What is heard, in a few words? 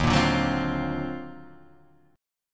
D sharp Minor Major 13th, Guitar, Half Step Down